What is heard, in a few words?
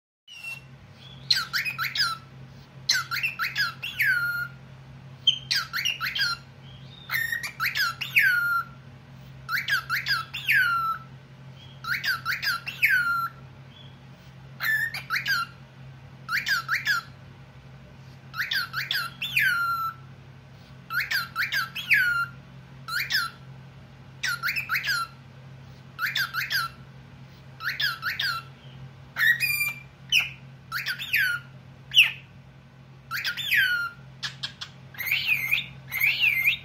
cockatiel Speaking; albino